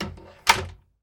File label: door close; Golden Crayon Sounds